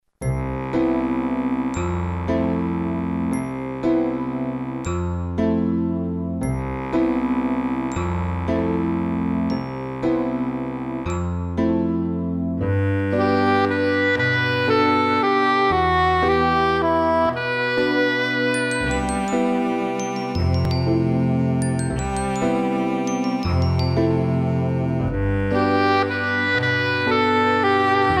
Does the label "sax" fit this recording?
Yes